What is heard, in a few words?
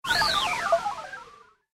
Fast Droid Flyby; Robot Sound Effects; Whoosh Sound Effect